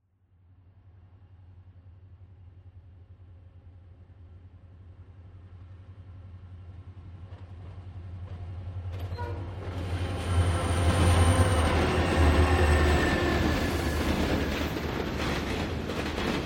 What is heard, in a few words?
Diesel Train Pass; SOUND EFFECTS1